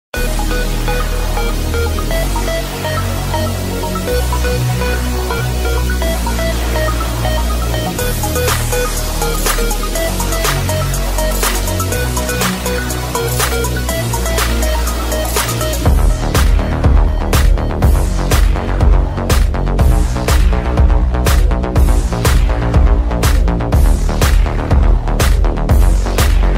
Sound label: instrumental